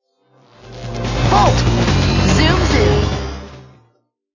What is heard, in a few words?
zoom zoom, running